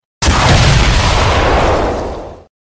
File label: three hit